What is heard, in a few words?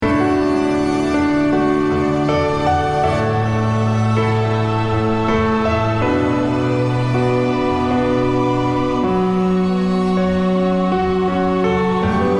Healing Melody